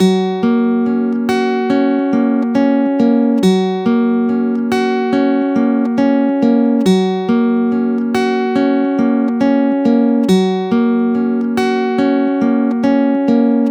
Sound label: Guitar 140 BPM D#min; Guitar Loops; Ghosthack; Ghosthack Advent Calendar 2021; Fairytale